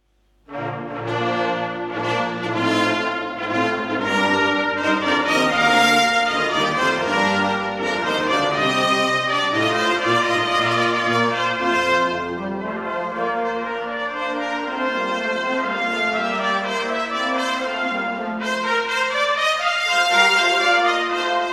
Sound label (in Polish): trabki hymn na koniec; schola